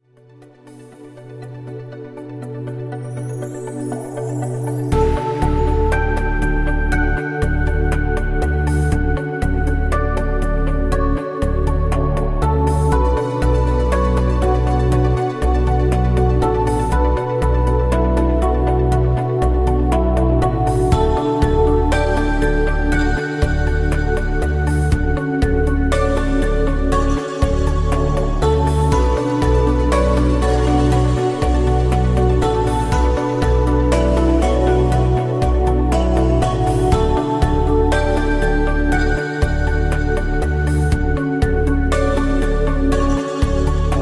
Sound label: Healing Power